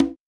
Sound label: Roland Juno D Perc Menu Kit